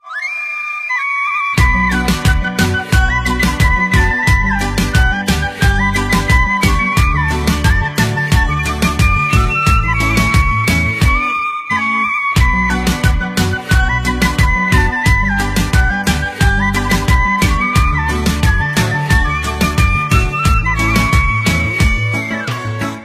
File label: Flute Ringtone